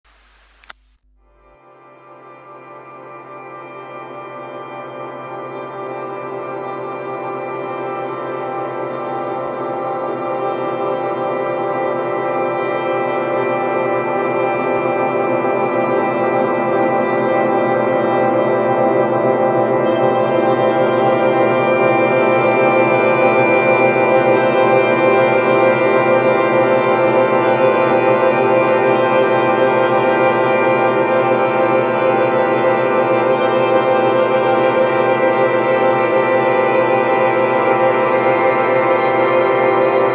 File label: Lull (guitar infinite reverb)